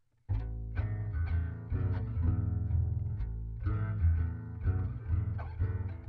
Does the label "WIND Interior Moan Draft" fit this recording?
No